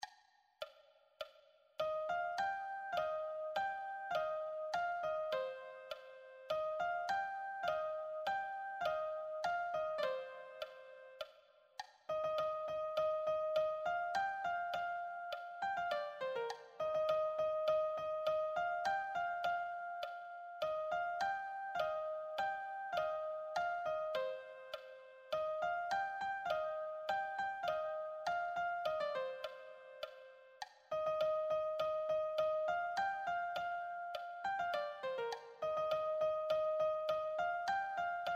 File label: melody